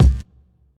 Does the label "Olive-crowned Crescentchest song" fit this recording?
No